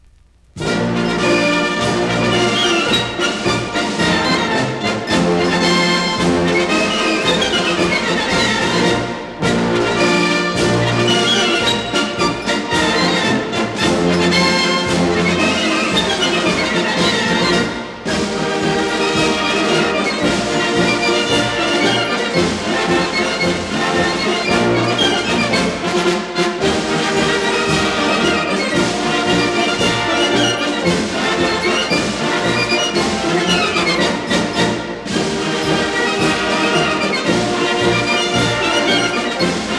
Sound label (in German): Bayrischer Defiliermarsch, Hi-Fi German Marches; Das Musikkorps L.A. Der Bundeswehr; 1958